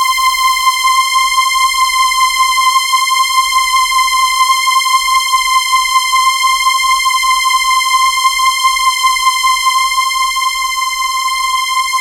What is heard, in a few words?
C5, Synth Line; 90 s Sample CDs; NiceBeats; SynthLine VOL-18